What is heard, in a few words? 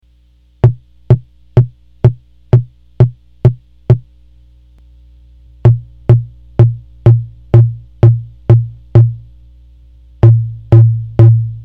low freq